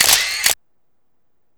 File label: SE CAMERA; maimai